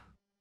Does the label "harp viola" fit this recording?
No